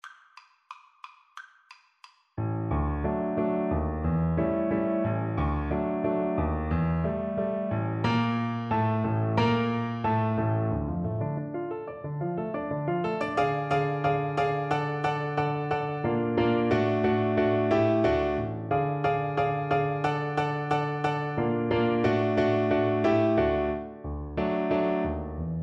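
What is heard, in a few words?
karaoke, Viola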